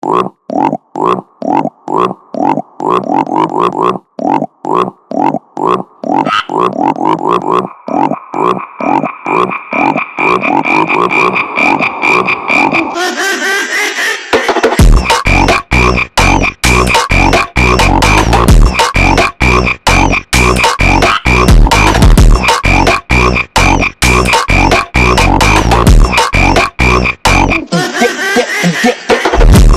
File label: Brazilian Phonk